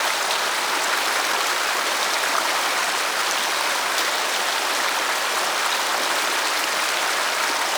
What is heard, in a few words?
Water Fountain Loop; Warframe; Ambience; Nineteen Ninety Nine